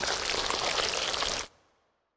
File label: Blob moove